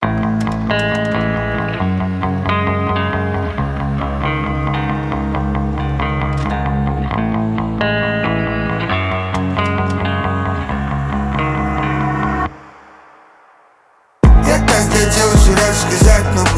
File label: unison